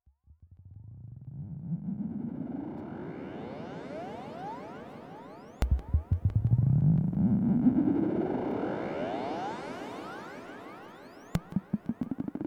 spectrum fx

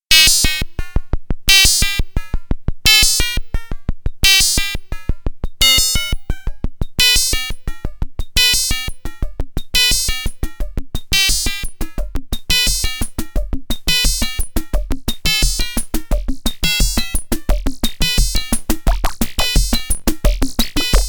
pro1 monotribe sync

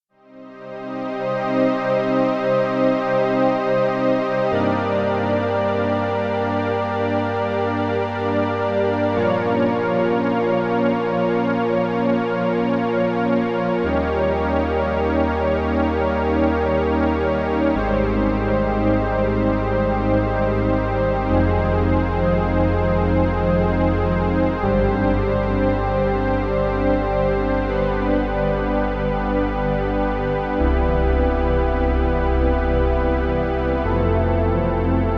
simple Pad

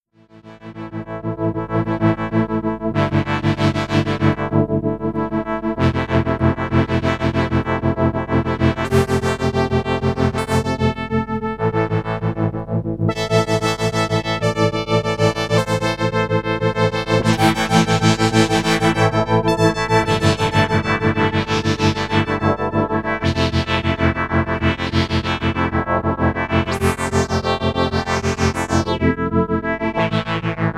cs50 Pad; Curt Nolte

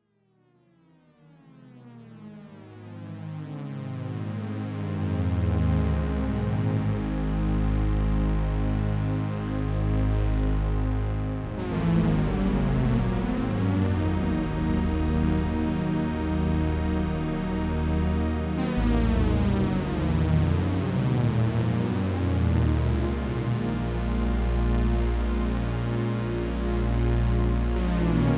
Sequential Prophet T8 Poly Glide

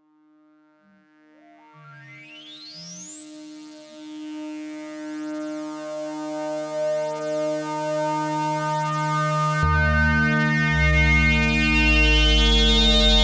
BPF Pad, synthesizer; DominionX

